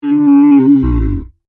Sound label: Dinosaur Groan, Creature